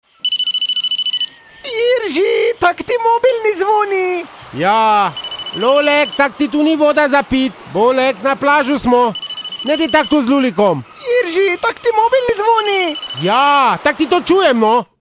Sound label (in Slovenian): morsko zvonenje; cehi